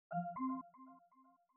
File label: connected